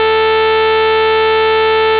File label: A saw